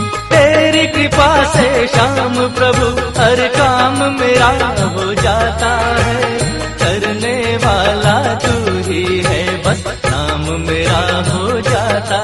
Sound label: bhajan; khatu shyam